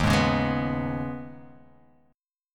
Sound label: D sharp 7th Suspended 4th Sharp 5th